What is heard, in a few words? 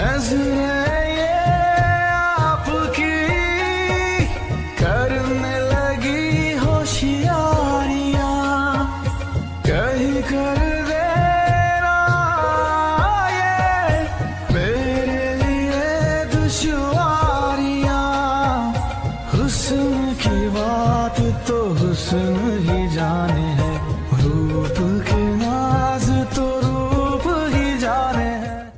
love romantic ringtone, bollywood ringtones